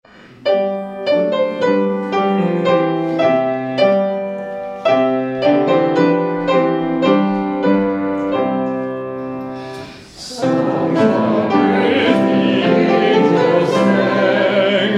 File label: hymn; Songs of Praise the Angels Sang